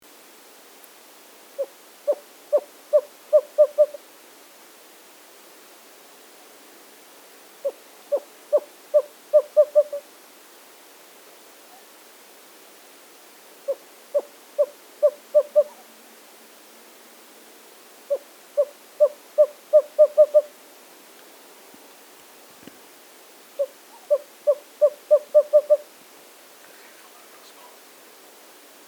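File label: Western Screech-Owl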